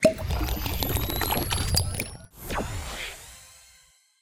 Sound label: slurp; cstrike; pubg